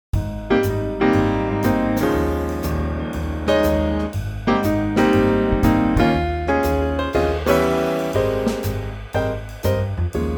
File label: Ab Trio